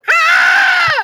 scream

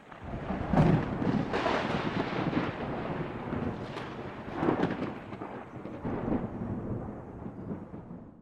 gift lightning

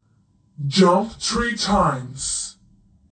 jump; simon 5

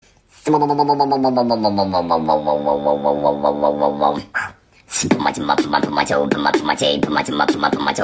goofy ahh beatbox

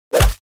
punch sound

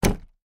dressing room close

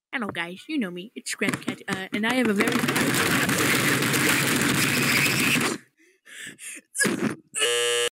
cat falls down stairs; scratch

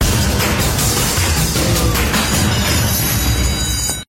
victorious transition music

